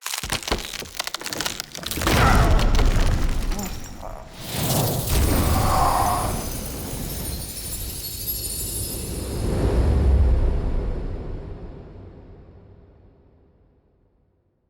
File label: Grabs Acorn SFX; Wazard; Bromius; Envoy